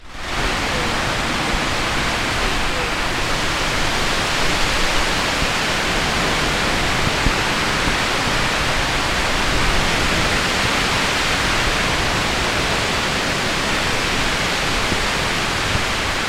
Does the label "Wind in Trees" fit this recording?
Yes